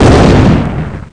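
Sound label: medium explosion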